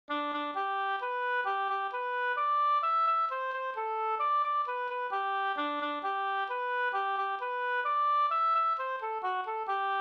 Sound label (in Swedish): mazurka